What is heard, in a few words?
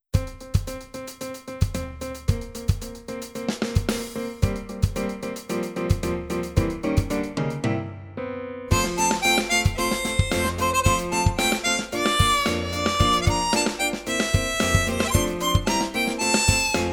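jingle bells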